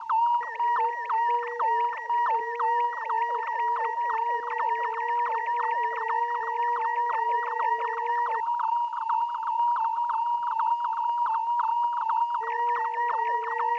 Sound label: texture loop 120bpm; ultimate pack